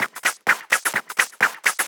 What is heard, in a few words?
FX Loop 128 BPM, House